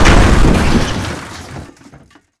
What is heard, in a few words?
Crash; FSR 2011